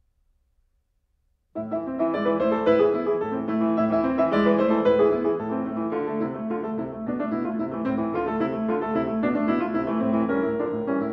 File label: Tarantella For Piano In A Minor; Glinka